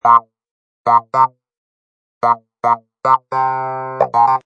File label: Funk Clav A, Funk Clav loop in A# at 110bpm.; Funk Clav Loop Riff A# 110bpm; Keyboard Loops